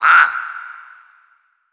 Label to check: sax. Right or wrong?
Wrong